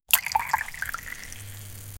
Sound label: alcohol poured in a jigge